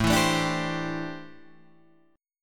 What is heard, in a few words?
A Minor 11th A, Chord